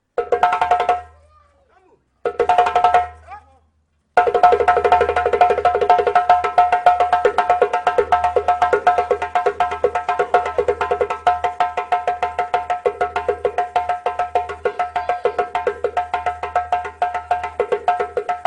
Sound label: instrument